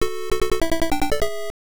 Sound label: Unused Jingle